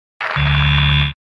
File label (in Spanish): respuesta incorrecta